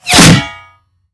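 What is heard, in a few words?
tank ricochet; tanks; War Thunder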